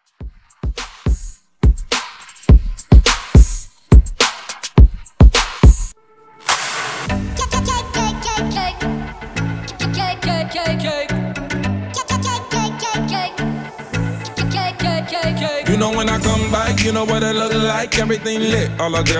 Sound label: Re drum